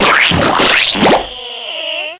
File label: squash; Casio Colordesk Slides and Sound 1997